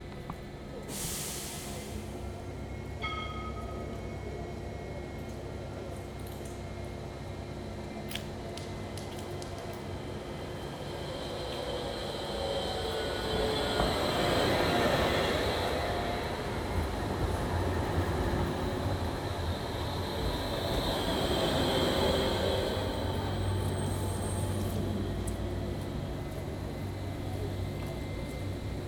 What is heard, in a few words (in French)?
Nantes - Matin - Tram depart; Ville; ZoomH2N